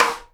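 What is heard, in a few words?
SIDE STICK, Live Snares; E-MU Producer Series Vol. 8 – Platinum Phatt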